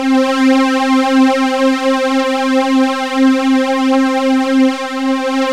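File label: PAD, synth; AMG - Now CD-ROM (Roland); Old Gold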